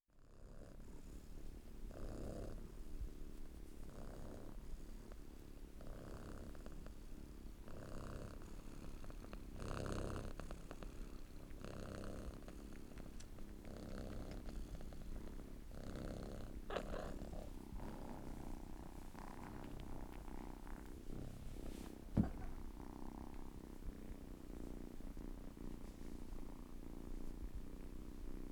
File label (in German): Katze